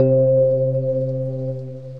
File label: Ceramic Dreams, Mallets; Majestic Mallets